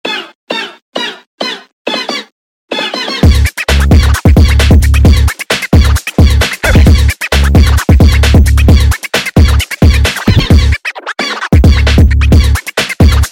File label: sped Up, funk